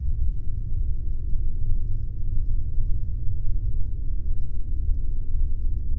Vaulted Ambience

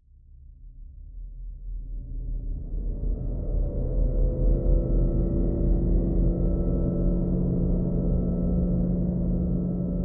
Thriller Ambient

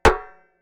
Footsteps on metal

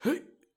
Voc Male Jump

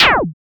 Laser

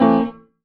Piano F Minor Chord 3rd Octave

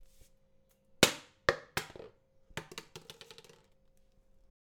Plastic Bottle Drop

